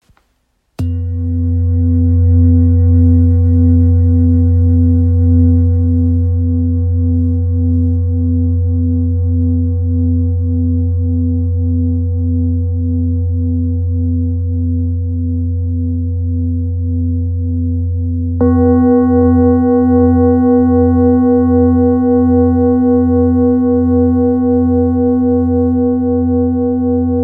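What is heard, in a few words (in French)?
bol therapeute la, bols chantants tibétains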